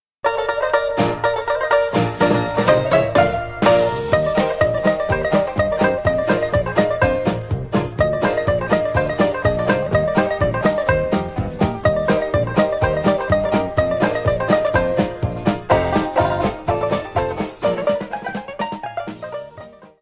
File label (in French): Rag, Etats-Unis